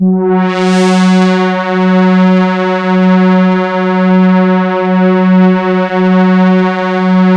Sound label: MONO PAD; PADS